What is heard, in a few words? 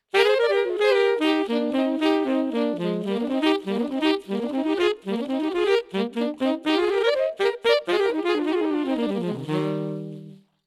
Tracking Speed PS-6